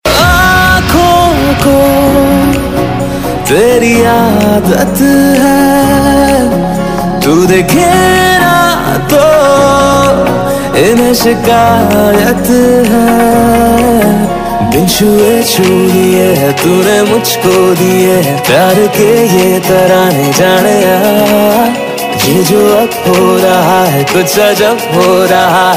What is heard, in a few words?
male version ringtone; Bollywood